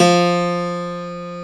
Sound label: PF, GRAND PIANO; AKAI S6000 CD-ROM - Volume 1